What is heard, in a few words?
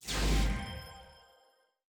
dark mage heal impact